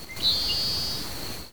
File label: Seaside Sparrow